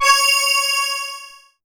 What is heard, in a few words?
C#, BELL GONG, Synclav FM; Big Fish Audio